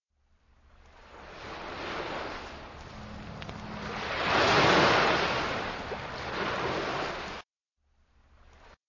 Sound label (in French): ambiance plage mer